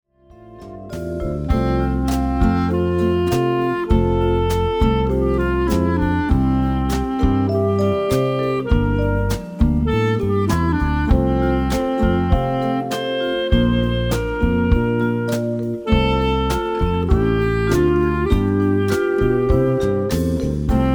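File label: Clarinet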